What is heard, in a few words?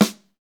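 snare rolls; Brush&Rolls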